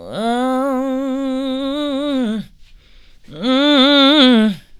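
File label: uoow, female vocals, left; PlatinumPhattCD2; E-MU Producer Series Vol. 8 – Platinum Phatt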